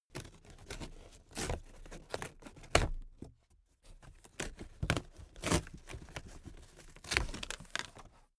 cardboard box big open handle

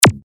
synth zap

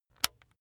razor attachment extend

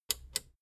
plastic button small latch click enable slow

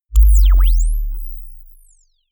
bass drop sizzle

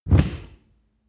dishwasher door close

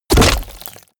club impact crack wet

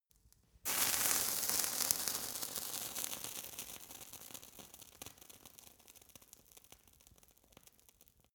fire torch sizzle long

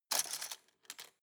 cutlery plastic drawer grab hard

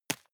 juggle catch medium

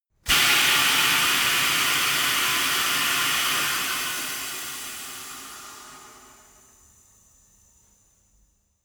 tire changing machine hydraulic hard in

